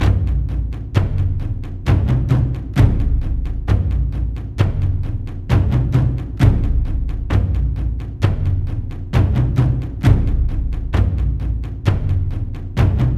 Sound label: Drums; For Honor